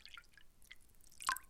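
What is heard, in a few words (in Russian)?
вода капля множественный далекий приглушенный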